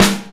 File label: sizzly acoustic snare g key; snare drum samples